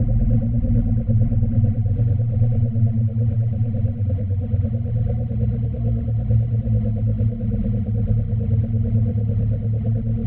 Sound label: glow atmospheric background